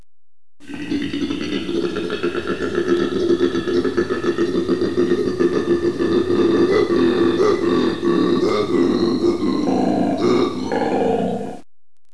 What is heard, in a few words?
koala grunt